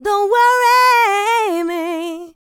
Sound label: LICKS, Vocal R&B Dance; LT DANCE